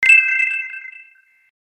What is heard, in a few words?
Realme SMS; C51